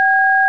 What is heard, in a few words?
dtmf b